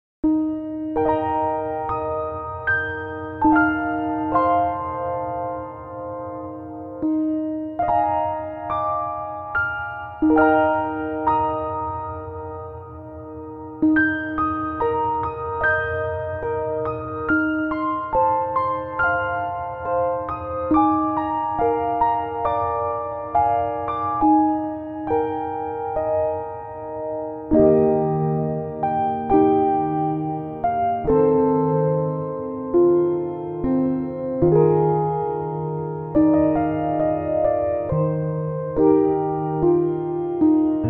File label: laughter tears, magical piano